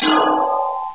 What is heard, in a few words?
glang, ambience